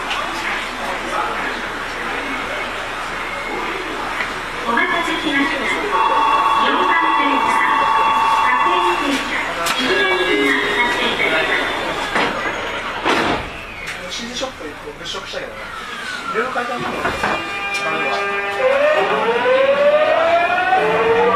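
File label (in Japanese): 走行音, 9000系; 中目黒-代官山; Tokyu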